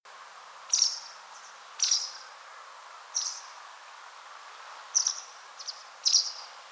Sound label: White Wagtail, Bachstelze